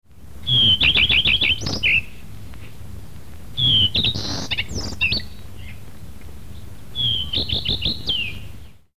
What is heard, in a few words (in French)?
front blanc